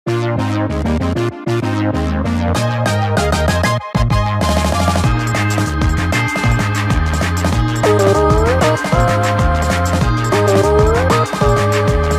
Balloon Battle; Mario Kart Tour; android

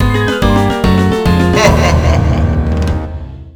boss fail

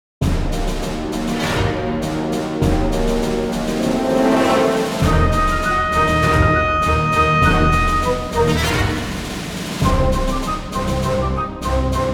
Battle Start

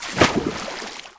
Water Splash

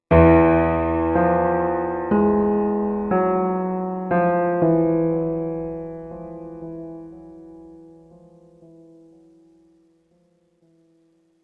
One shot Piano; Zombie Army 4 Dead War

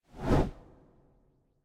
Chapter Completed Star Empty Appear

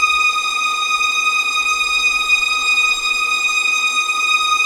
tremolo; Level4 Hidden World

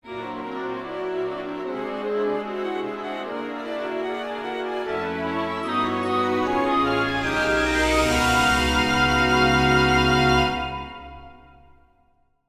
bgm exit cave; Meitantei Pikachu Shin Konbi Tanjo

